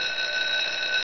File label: SCHOOL; 铃声素材